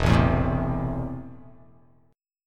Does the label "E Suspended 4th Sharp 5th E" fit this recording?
Yes